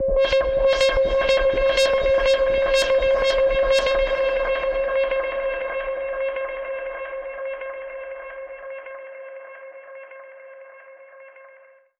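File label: Modulation Proc, alarm siren samples, 120bpm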